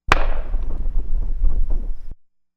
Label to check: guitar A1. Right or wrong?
Wrong